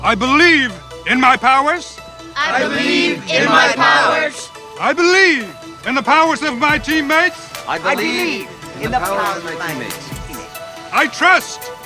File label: Intro Music